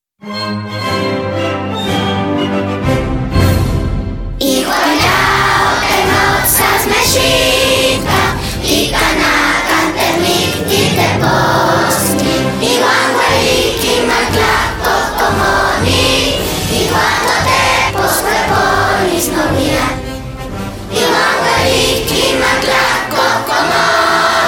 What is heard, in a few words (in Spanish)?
Himno Nacional Hahuatl; Raices Ancestrales